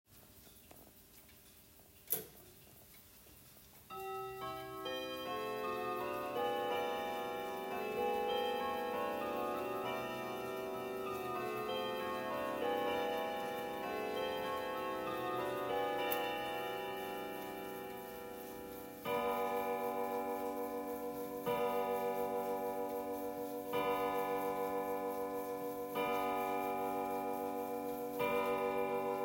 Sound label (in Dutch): Staande klok 3 melodieen; Staande klok met 3 melodieen